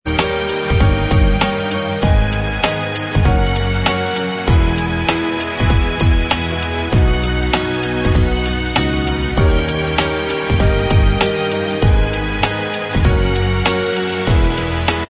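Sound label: instrumental, backing tracks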